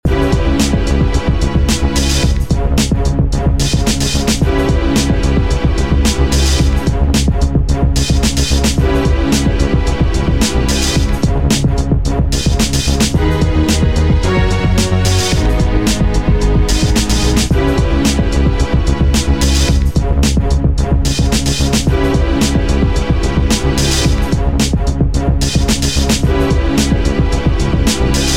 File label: music boss; Taiker